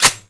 slide fwd, weapons; Valorant; ghost

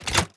grab, weapons; cstrike; valorant; singurality phantom

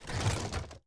Equip; Valorant; odin

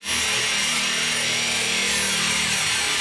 Inspect, karambit; cstrike; valorant; rgx karambit

